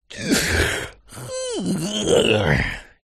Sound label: cartoon yawn man